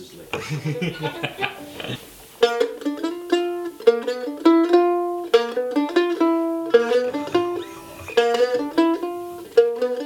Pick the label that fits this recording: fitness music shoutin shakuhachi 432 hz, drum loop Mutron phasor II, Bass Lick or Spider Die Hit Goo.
Bass Lick